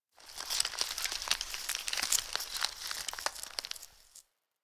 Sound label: Ice Crunch Rand Amb